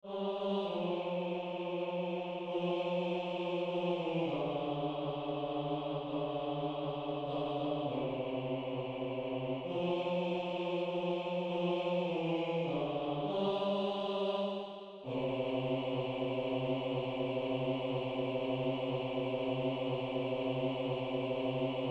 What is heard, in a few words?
lonesome bass; SANDY SANGERSTEVNE 2014